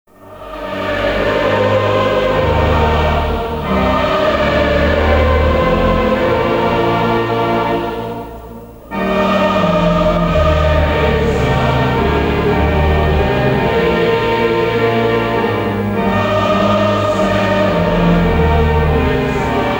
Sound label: Church Ambience - Singing; Halloween Sound Effects